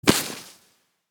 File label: plant